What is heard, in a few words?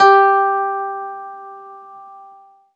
ZITHER, Alpine Volksmusik